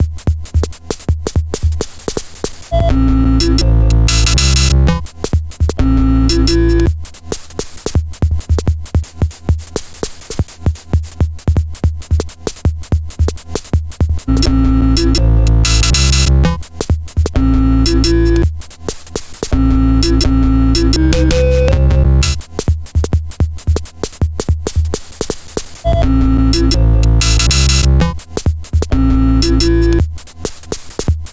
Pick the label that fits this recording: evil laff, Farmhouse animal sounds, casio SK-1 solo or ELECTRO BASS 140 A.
casio SK-1 solo